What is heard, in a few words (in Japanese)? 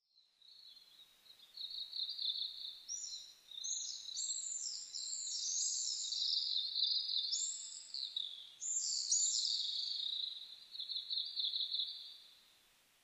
amatubame